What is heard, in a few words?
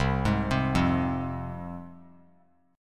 C Suspended 4th Sharp 5th, C, down, chord; Csus4#5 arpeggiated; JGuitar